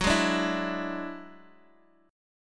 F sharp 7th Suspended 2nd Sharp 5th, down, Guitar, Open D